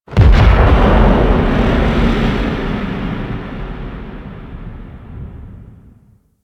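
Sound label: Ship Distant Damage Explosion; Warframe; Corpus Ship Remaster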